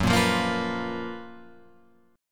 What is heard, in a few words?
Gb Major 11th down Guitar Open F; GbM11 strummed; JGuitar